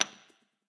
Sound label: chip First, roulette; frenchroulette3